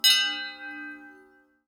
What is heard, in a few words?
level up